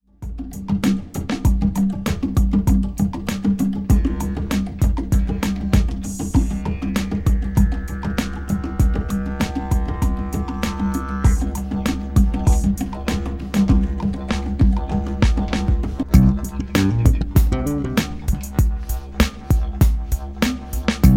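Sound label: Didgeridoo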